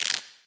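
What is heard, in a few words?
electricity; smite; kuzenbo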